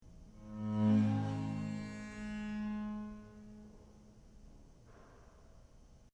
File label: G sharp roll